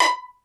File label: PIZZ CELLO B4 L; Greytsounds VOL-1; STEREO PIZZ2